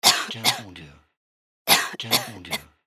Cough Clear Throat

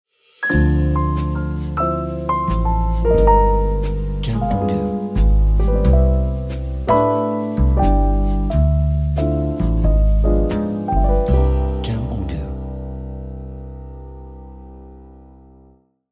Slowly Swing Intro Logo

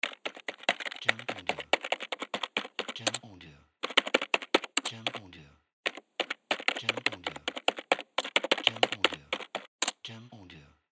Typing Text

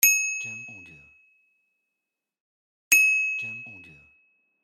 Bells

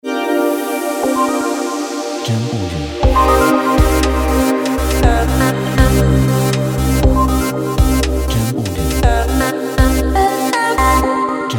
Broadcast Opener

